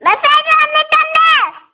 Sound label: Malayalam message alert ringtone